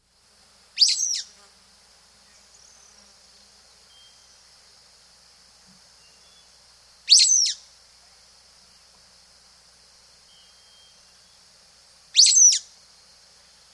Sulphur-Bellied Flycatcher, S. America

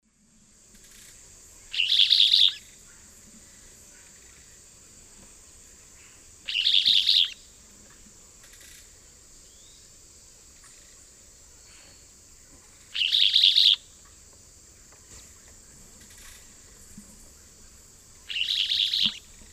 Euphonia rufiventris, song or calls male; S. America; Eastern Lowlands

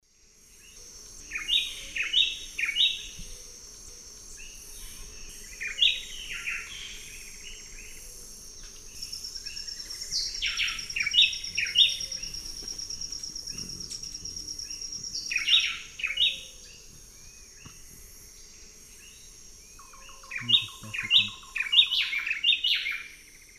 Cantorchilus leucotis, song and call; S. America; Eastern Lowlands

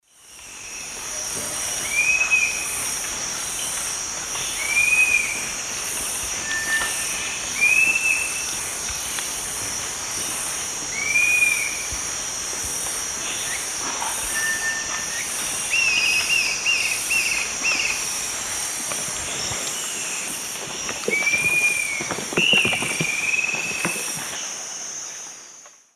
Myiarchus tuberculifer, call and alarm call; S. America; Eastern Lowlands

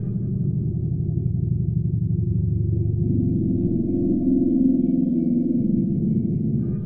Psycho Drone; Dance; SFX

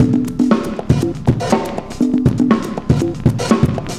cooling 120, loops, 120bpm

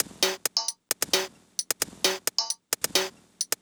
HiHat Perc Loop, Dance Beats

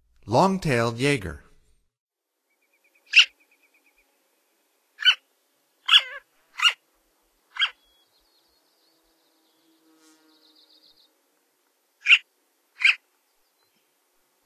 Long-tailed Jaeger; North America; Stokes

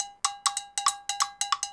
timb cows 140 bpm, march latin; Sound Effect Library

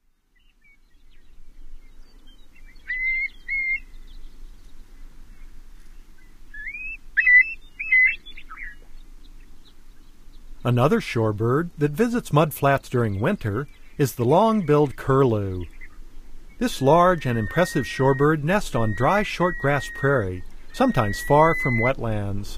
Long-billed Curlew; North America; Common Western Species; Know Your Bird Sounds

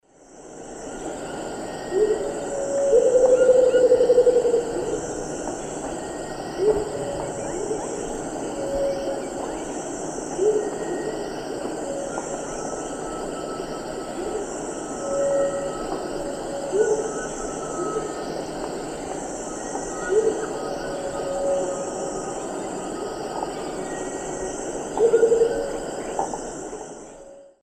Momotus momota, hoot and roll duet; S. America; Eastern Lowlands

